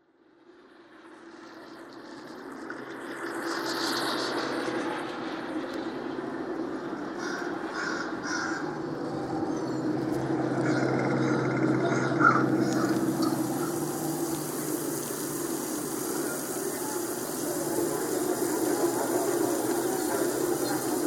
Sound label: Luminous Soundscape